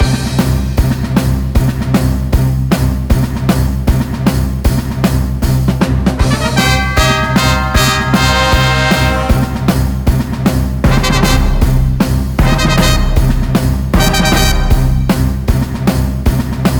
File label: vs loading; nickelodeon all star brawl 2; ps4; xbox series xs